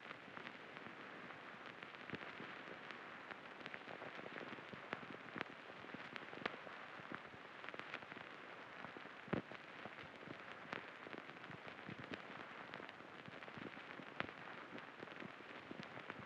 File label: 80 BPM G# Min Vinyl, Ambient; Melody Loops; Moments; Cymatics; ANALOG EVOLUTION Beta; Ambient Collection